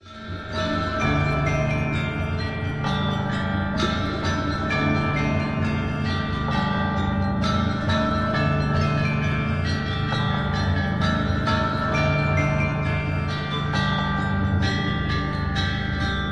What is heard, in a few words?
Imperial Bells of China; Heroic Air of Chu